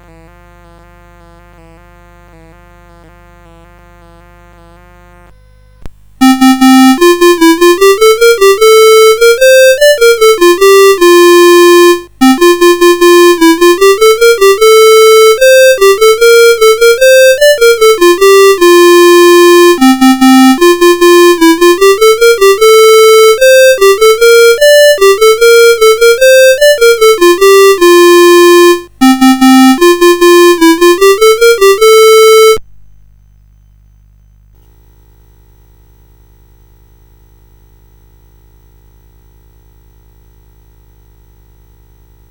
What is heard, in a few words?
Twelve Days of Christmas, CSG8580